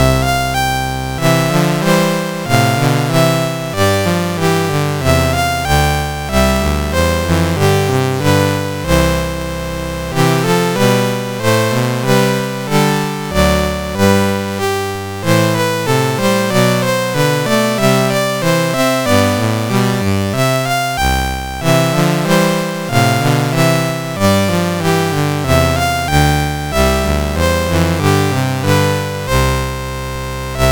Rustic Dance, MOS6581R4

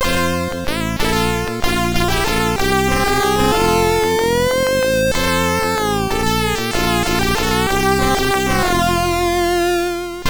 Spook, sid MOS6581R4

